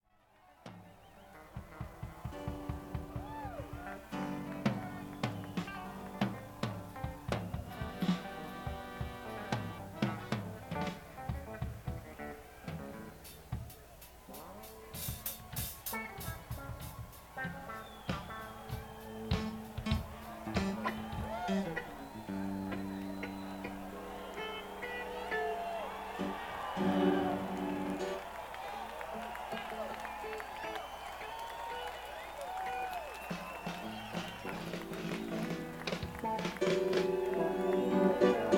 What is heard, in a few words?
Tuning; telluride town park